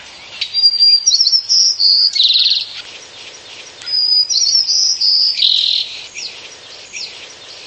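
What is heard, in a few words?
multi-voiced singing of sparrows in nature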